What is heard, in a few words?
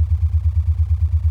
100Hz Doppler; 11x100HzWQDoppler